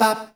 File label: VOC MAD; AMG - Vince Clarke Lucky Bastard